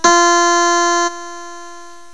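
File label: HARPSICHORD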